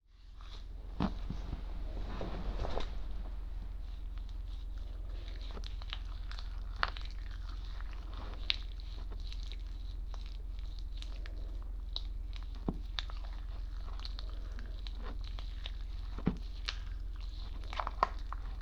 大事なアソコのクチュクチュ音, ASMR

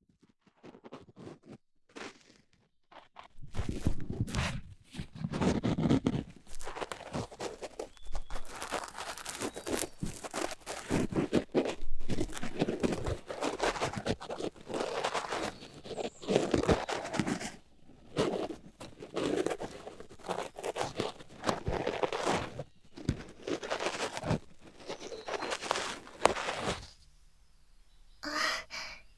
癒しの耳かき; 一日の始まり～癒しの耳かきと朝一番フェラチオご奉仕。

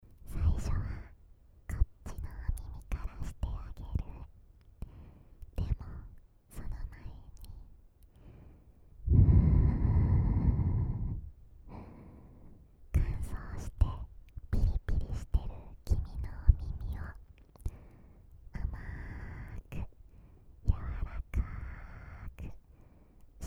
お姉ちゃんの甘い吐息（右耳）; 心拍音off

